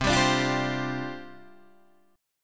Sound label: C Suspended 2nd Suspended 4th, down, Guitar, Open D